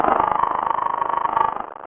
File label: monster scream